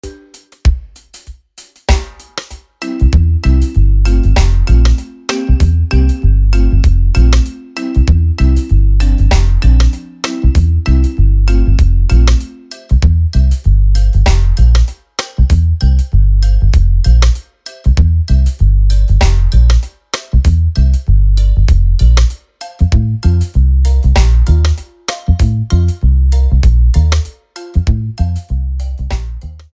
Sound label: Bouncing Jamaican (bass); kidsfun